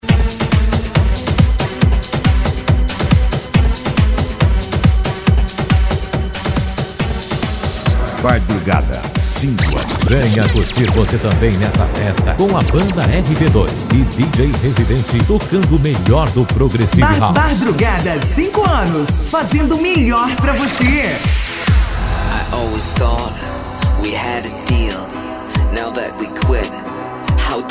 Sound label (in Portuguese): sertanejo remix e electro dance